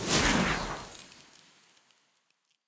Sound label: shoot miss; diamondhead; Ben 10 - Omnicode